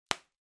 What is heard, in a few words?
Bubble Wrap (Pop Close); 気泡緩衝材; プチッ 近い; 梱包材